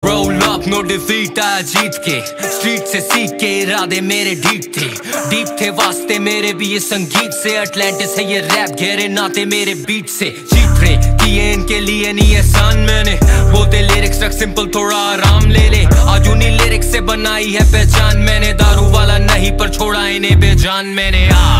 Roll Up Rap

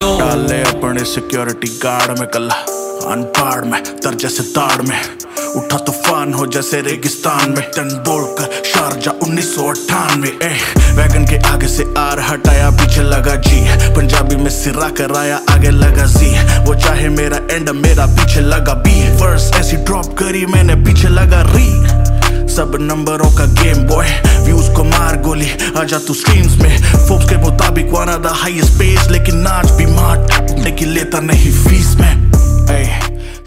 Roll Up Rap

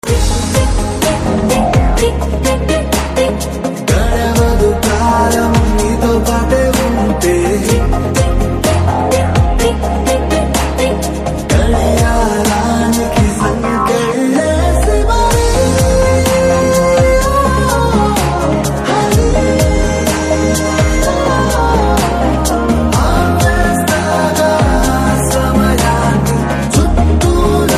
Ringtone; Savyasachi; Telugu

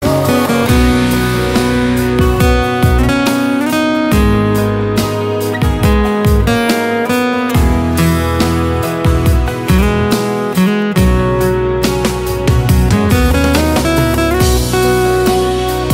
Instrumental

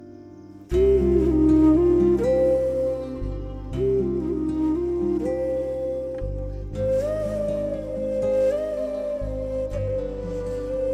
Painful Love BGM; Kannada

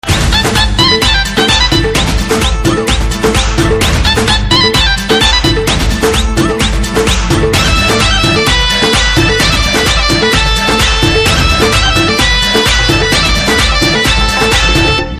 BGM Ringtone; Dabangg 3

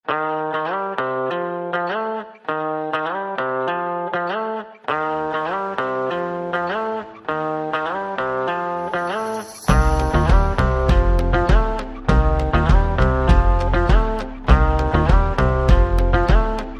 BGM Ringtone

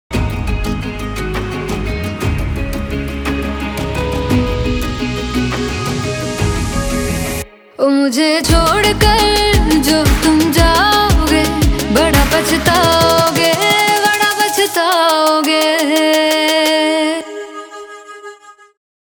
Female Version

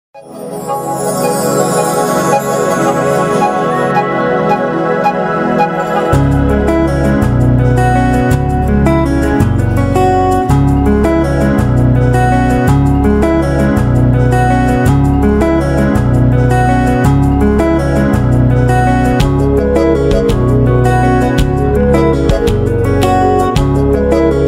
Love BGM, Telugu

